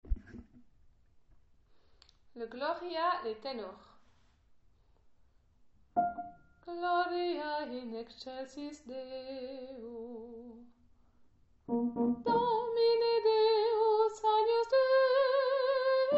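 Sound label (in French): Haydn gloria ténor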